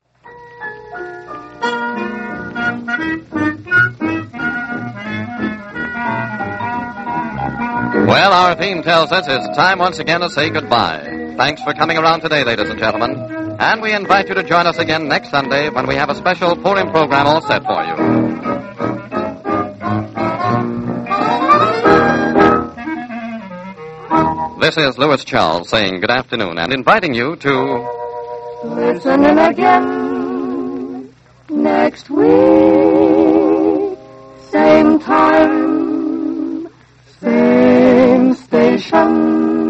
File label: sign off to yiddish melodies in swing, yiddish radio; the yiddish radio project